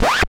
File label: SCRATCHES